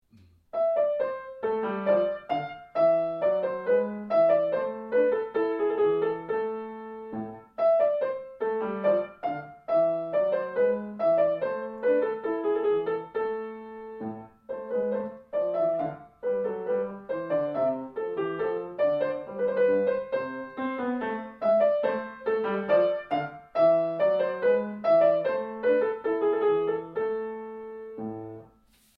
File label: Bourree